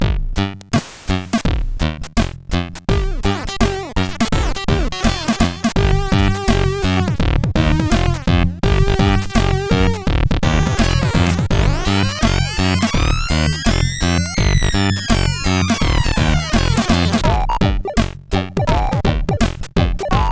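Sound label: Bad Eggs game over, MOS CSG8580; sid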